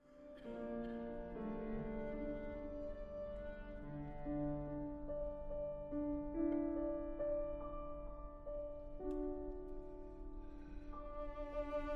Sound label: Serenata Notturna cello and piano